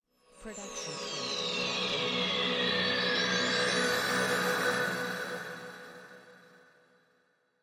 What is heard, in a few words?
spaceship launch; soundscrate